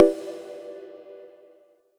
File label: menu play click; megamix